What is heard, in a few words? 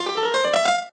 piano scale